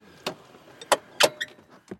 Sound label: golf cart stop and change gears stereo